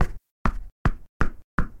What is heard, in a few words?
Low Bounces